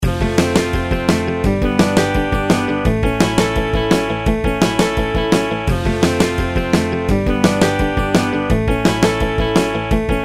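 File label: outro background music; Route 66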